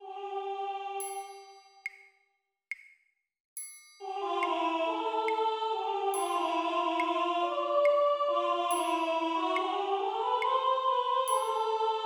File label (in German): kantate Alto; kantate-lobe-den-herren; BWV 0137